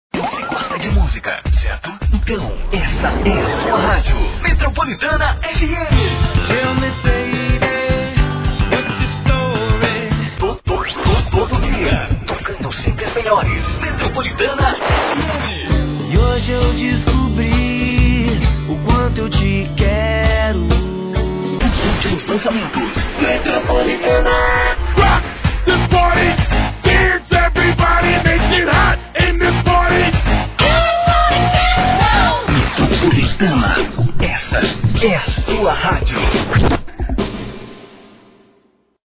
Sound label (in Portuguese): vinhetao